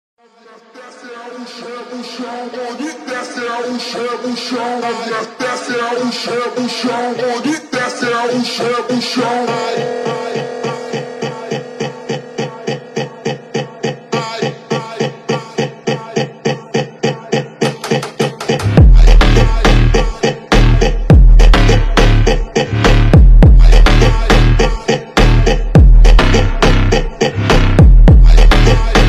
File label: slowed reverb